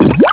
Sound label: Blub